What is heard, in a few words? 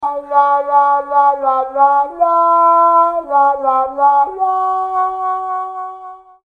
Trombone